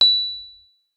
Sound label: MK1 B7, RHODES; Xsample VOL-1